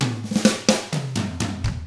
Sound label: 127 SURF ROCK